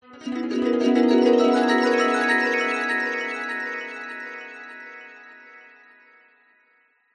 sound design magical rising twinkling nostalgic

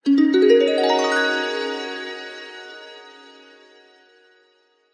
sound design harp glissando dreamy washy; zapsplat

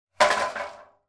industrial metal ladder movement

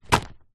household rubber glove drop on wood

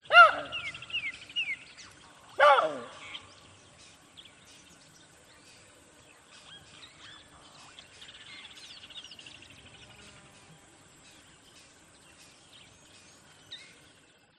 Baboon; audio hero

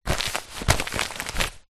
parcel large padded envelope movement; foley

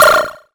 science fiction console feedback tone

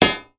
impact metal heavy thump clunk

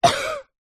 human child boy 8 cough

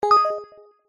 game sound soft warm digital tone positive select

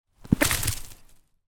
animal chicken run startled

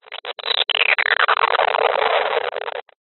science fiction radio signal interference burst

